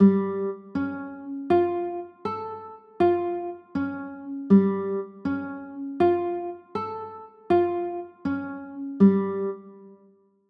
a sharp 6 9, chords

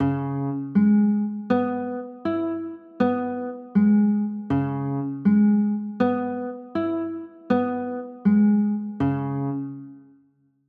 c sharp m7

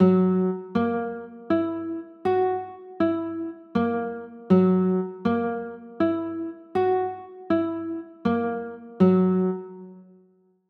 f sharp 9

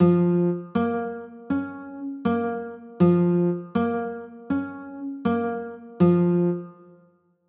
b flat m, chords

